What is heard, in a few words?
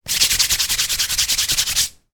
human hands rub together as if to warm up